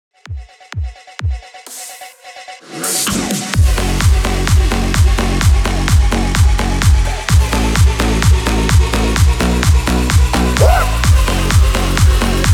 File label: MASHUP 128 BPM